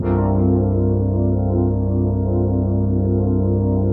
122 BPM Pads Amaj Gmin Tonic G, ambient synth pads; MMB